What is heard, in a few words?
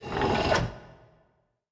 door box